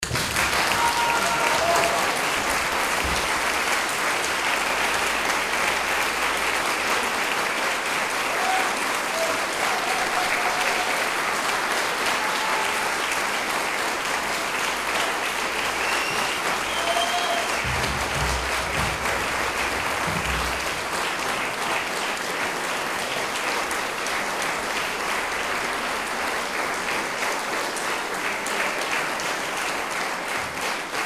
applause

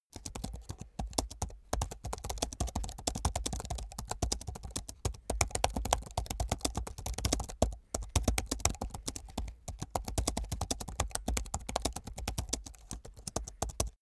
keyboard laptop type sequence fast hard

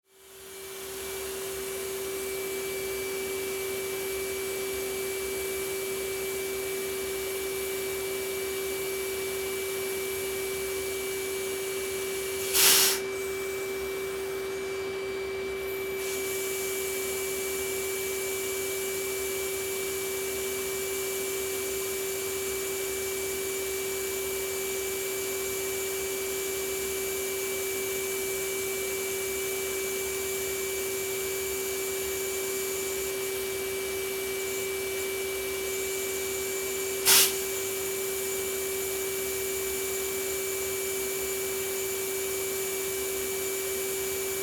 aspirator

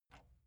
metal button spring release

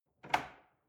drawer large trash draw out lock

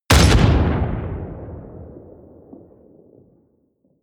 artillery medium close

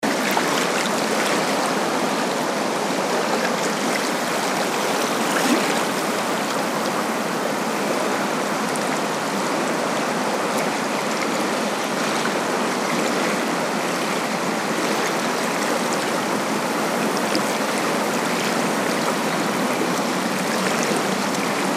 river big size

